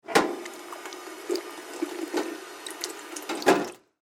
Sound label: Water Drinking Fountain On Run Off; Kitchen; Household